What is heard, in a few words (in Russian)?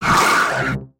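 монстр открыл пасть и заревел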